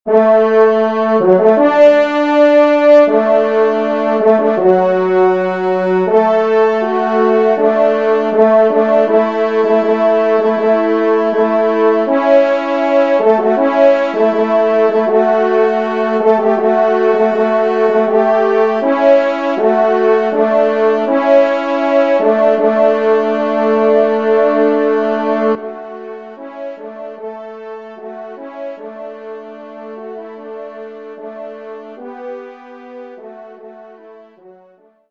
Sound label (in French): Entree, trompe; Devert; Entree 1e Messe